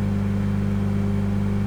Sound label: blender; edgar